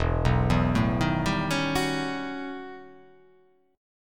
E Minor Major 13th E; EmM13 arpeggiated

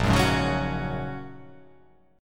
Bb Minor 7th Sharp 5th; Bbm7#5 strummed

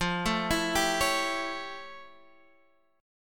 F Augmented Major 9th Guitar DADGAD

